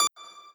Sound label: lead d